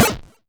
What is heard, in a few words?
Blip, 8-Bit; Games And 8-Bit Kit Volume2; atomsplitteraudio; chiptune